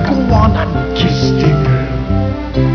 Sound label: kiss girl